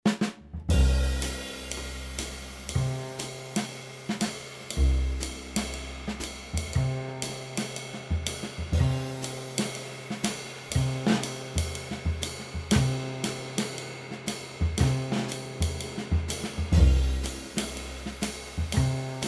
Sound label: 32 Bars of C 120 BPM